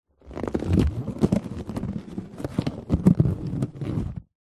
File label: foley balloon or inflated surgical glove handling movements; zapsplat